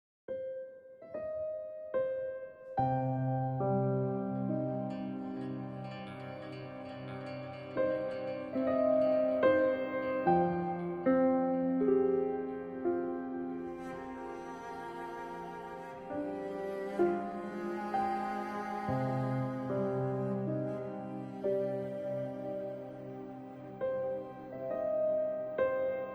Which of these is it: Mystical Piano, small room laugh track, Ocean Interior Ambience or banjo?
Mystical Piano